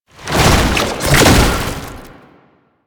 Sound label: Run Stop, Foley; Enemies; Warframe; Proto Infested; Babau